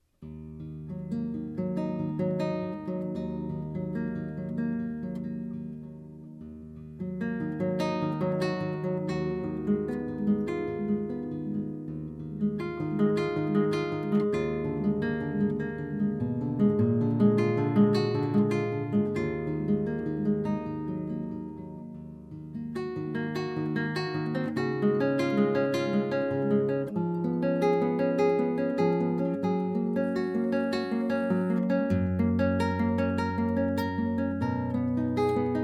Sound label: guitar